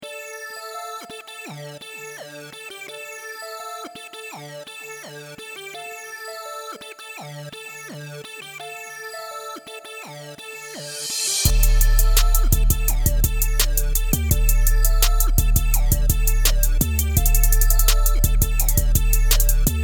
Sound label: chords